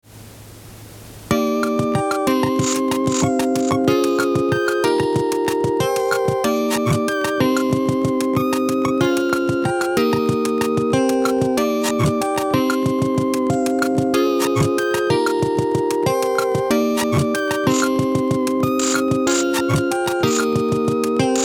Country Song Without (187.089 BPM)

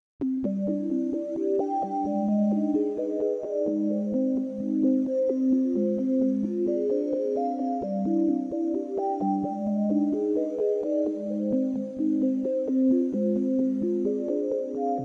130 BPM

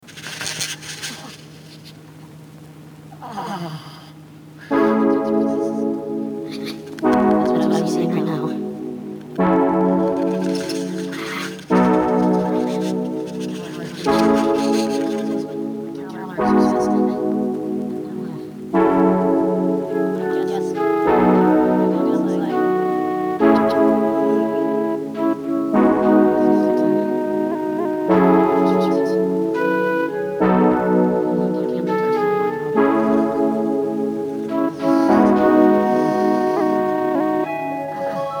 205.367 BPM

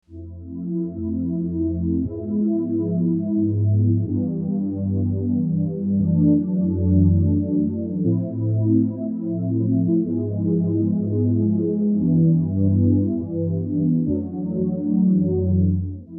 120 BPM

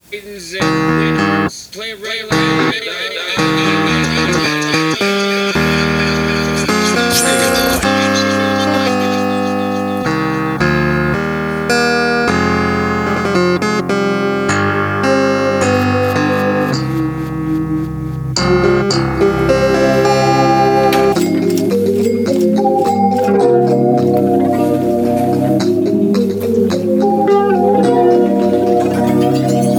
108.120 BPM, rap

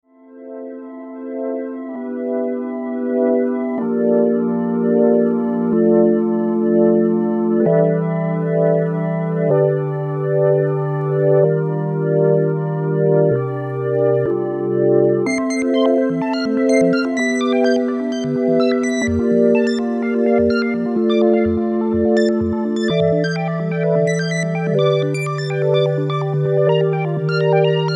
Break (126 BPM)

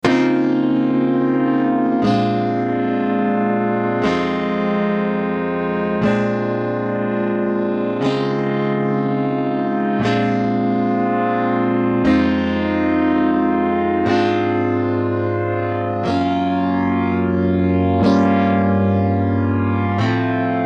Ns10 + Sub Combo Classic V2 (120 BPM)

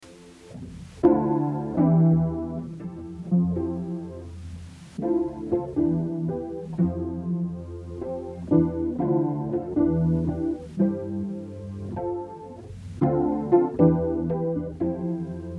120 BPM

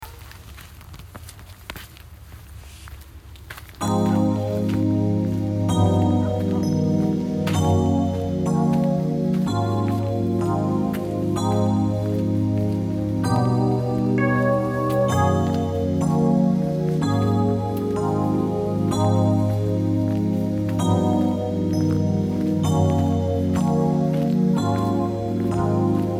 127.136 BPM

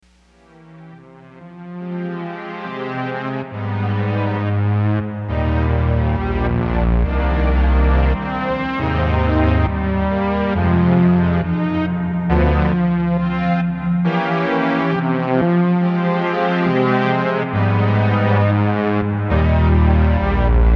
Chords, 137 BPM

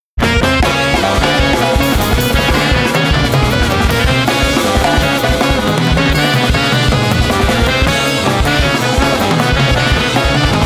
Sax

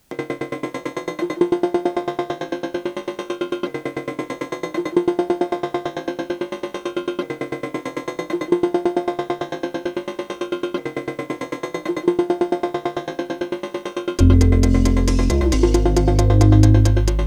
135 BPM

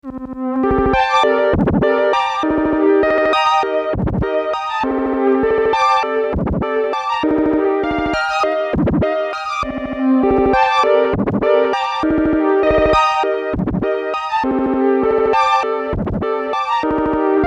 Noise Making Problem (100 BPM)

